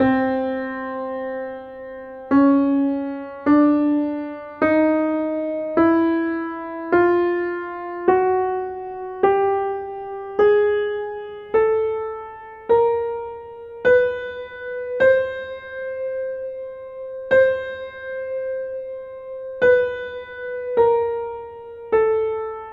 chromatic scale

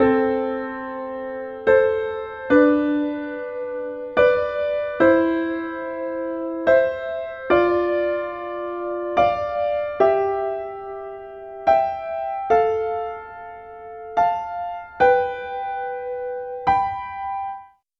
basic sixths

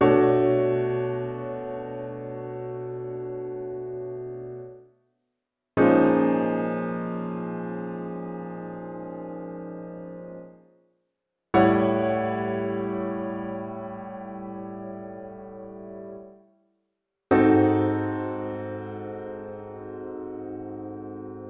chord extensions

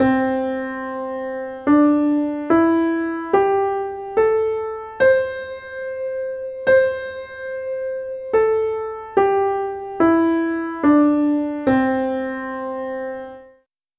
pentatonic major scale

